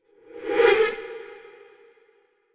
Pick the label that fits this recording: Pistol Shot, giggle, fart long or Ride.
giggle